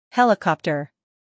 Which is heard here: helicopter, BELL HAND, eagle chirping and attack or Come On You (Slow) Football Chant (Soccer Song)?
helicopter